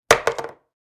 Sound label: Wood hairbrush set down